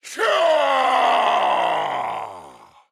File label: Attack Extreme